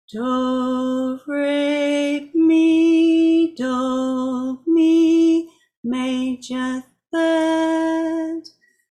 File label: Singing major third